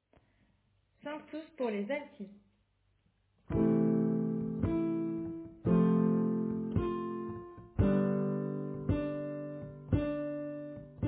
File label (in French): Sanctus Alto